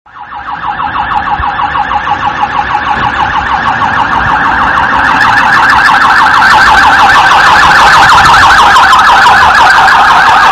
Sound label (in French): samu sirene